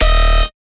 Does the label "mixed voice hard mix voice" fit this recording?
No